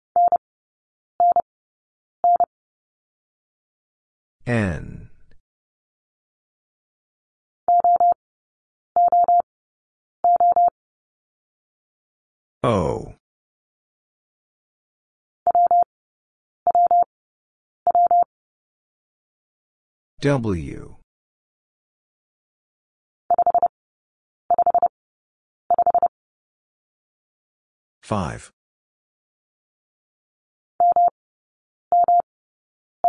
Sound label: Letter w Single Character Repeated 3x 30wpm, morsecode; Lesson 19b